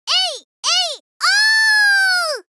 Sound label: ei ei oooo